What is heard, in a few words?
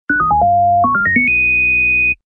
High Blop Tone